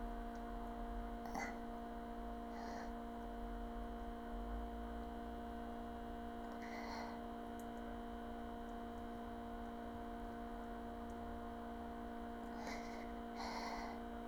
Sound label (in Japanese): 大、吐息ミチ音ポチャ音